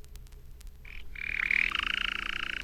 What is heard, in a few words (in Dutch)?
knor